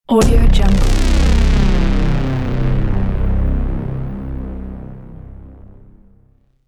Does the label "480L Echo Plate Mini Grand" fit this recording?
No